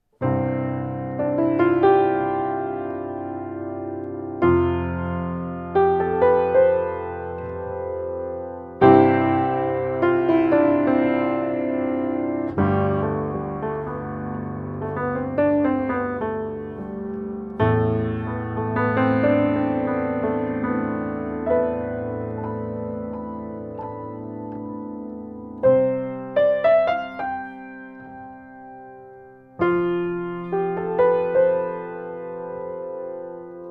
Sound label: Piano; AMI; Schoeps CMT541